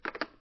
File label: hangup, phone